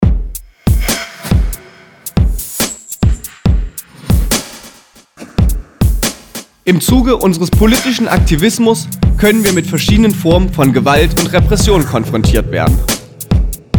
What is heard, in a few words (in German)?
jingle